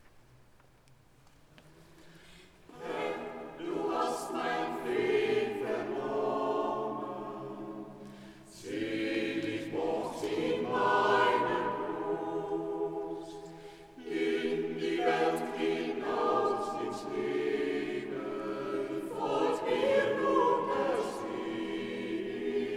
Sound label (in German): Schlussgesang; Schubert